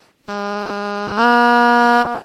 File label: singing